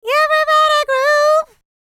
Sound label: LICKS, Vocal R&B Dance; LT DANCE